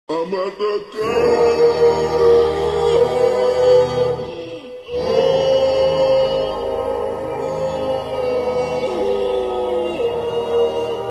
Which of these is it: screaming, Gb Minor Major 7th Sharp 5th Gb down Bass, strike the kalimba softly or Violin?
screaming